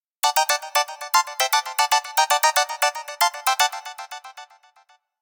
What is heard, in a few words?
Techno Ring; Asus MeMo Pad 7 K01A